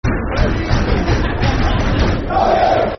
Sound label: (Clap) Col U. [Football Chant (Soccer Songs)], Colchester; Colchester United Cufc